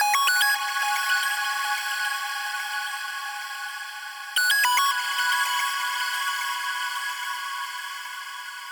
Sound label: echo arp; Rhythm Quest; rhythm-based